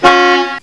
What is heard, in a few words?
car horn; freeciv